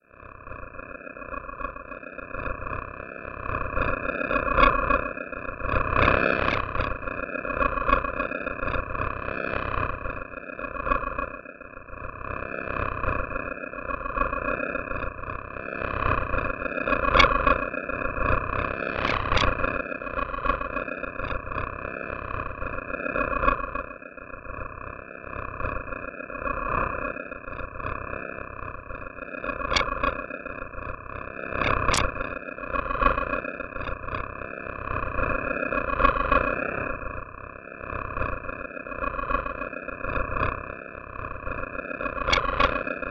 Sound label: sines Inside Sines